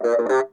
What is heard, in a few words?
Funk Guitar; 90 s Sample CDs; Zero G